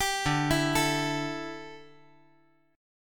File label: D Suspended 2nd Suspended 4th D down